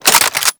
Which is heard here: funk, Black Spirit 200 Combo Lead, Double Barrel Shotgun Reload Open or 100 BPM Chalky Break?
Double Barrel Shotgun Reload Open